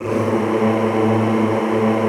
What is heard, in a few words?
A1-L, Extended Classical Choir; Best Service